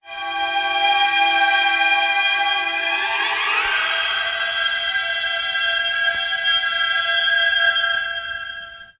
strings of horror

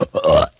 burp short

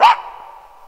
dog bark; Animals

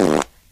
fart short

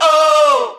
"oh" sung

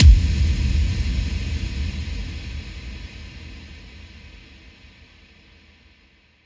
electric explosion; Crash Bang Boom

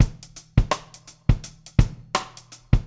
fathead, Drum loops Boombap

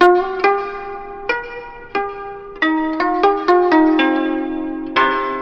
guitar, Jazzmatazz Loops

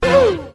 "ooh" male

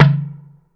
lightful, toms; Real

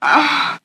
orgasm; Voice

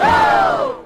"oh" group

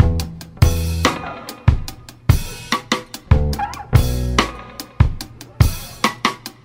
bass included, Drum loops, Funky

